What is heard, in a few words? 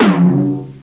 Cartoon; 特酷声音素材库